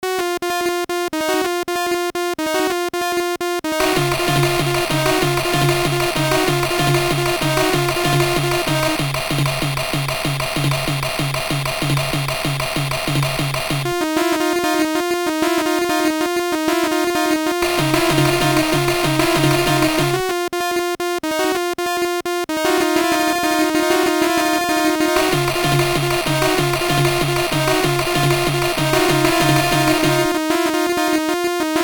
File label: funky deep swamp